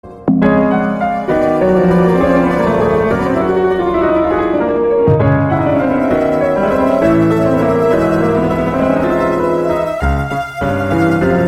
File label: Another Sad Day, RINGTONE; MIUI12